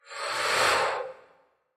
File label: sealing whoosh